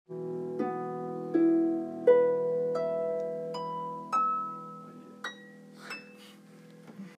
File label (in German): Harfe